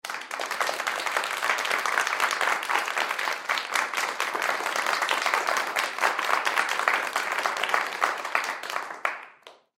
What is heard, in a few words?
Human Crowd Studio; Crowd Reactions Clap Long; Sound Effects:Voice